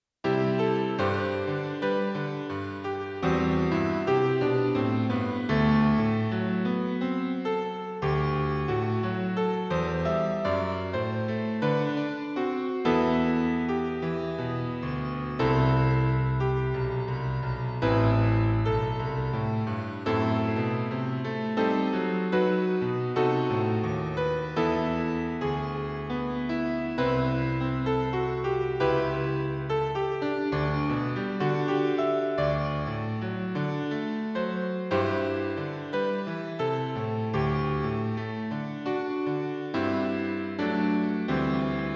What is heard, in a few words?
Brahmsian Romantic; model compositions